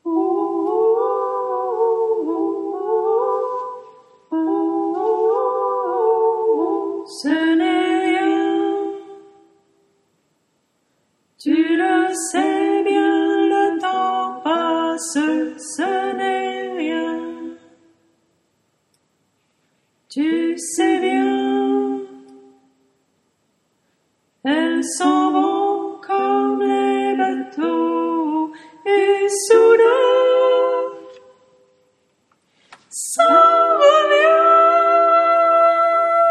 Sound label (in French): sopranes